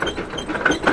Wheel Loop Creak; Puddle; puddle plus ios gamerip 2018

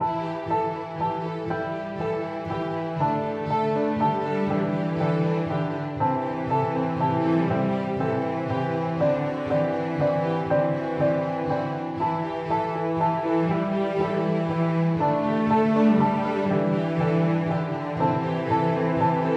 Hymn loop; dark water slime invader; switch windows

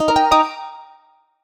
Minigame Win

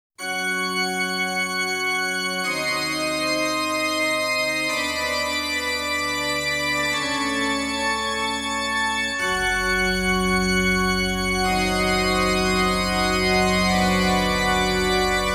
instrumental version; Bosstag

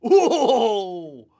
clown hit; Ringling Bros. and Barnum & Bailey; Wii

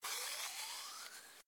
Door Pneuma Up OneShot

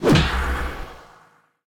Hammer jump; super mombo quest

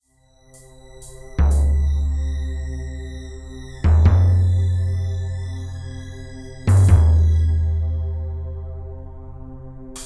Suspense Theme

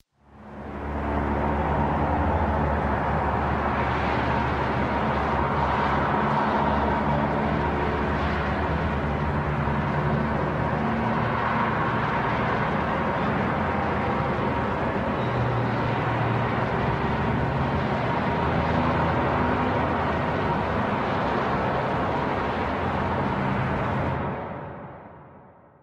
Ambience; Grimrush

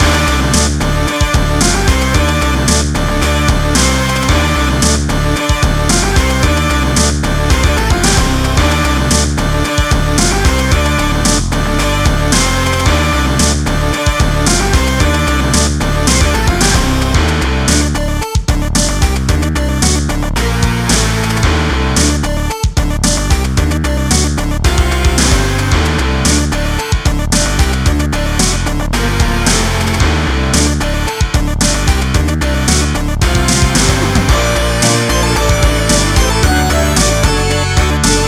Boss Battle

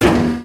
Servo Medium Start

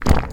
Coprs Ping; Puddle Plus

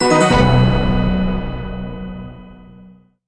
Levelup Sound

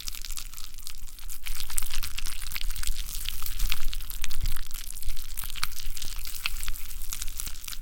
Flash Move Fast; Puddle

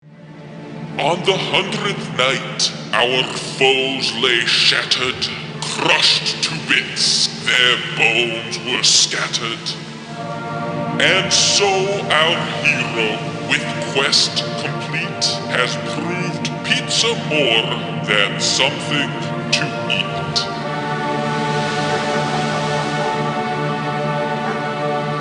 Quest Complete; Pizza vs. Skeletons

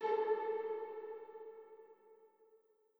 chime; chime sharp

